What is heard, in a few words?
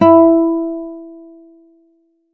synth orig